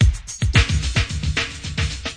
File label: thick echoes, 110 bpm, drum loop; 101 to 110 bpm